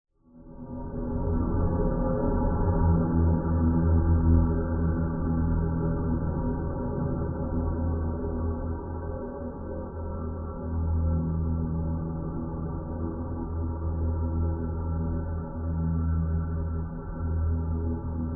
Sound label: science fiction drone large cavernous metallic